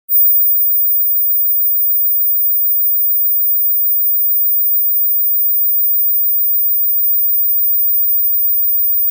Mosquito Ringtone; ringtones

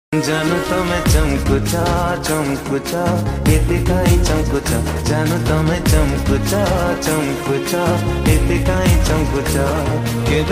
Odia Ringtone

